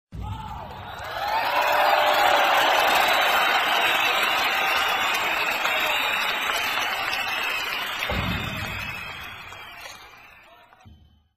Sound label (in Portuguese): aplausos publico